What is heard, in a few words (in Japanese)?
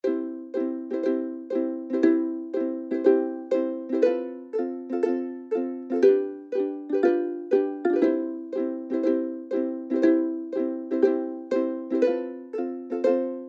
ウクレレ; 休日; 海を連想させる楽曲です; マリン系のＢＧＭ